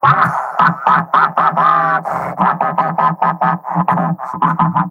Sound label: pyro laugh; vo; tf2; mvm